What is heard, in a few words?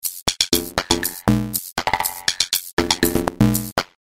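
loop rhythm crushed; Sound Graph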